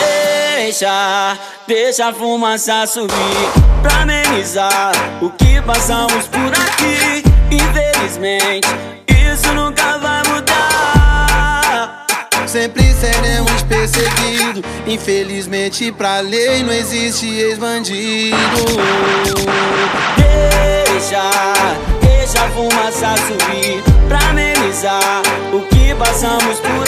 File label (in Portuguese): musica; cstrike